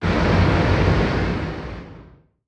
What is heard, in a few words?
distance auto cannon fire; weapons; Stellaris